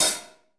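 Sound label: HH, hi-hat; Best Service; Hyper Dance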